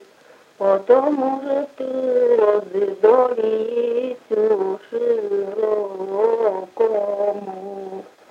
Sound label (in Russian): Былины; Во Неплыни-то реки там есь уж три струи; мезень; Речевой портрет; гласная вставка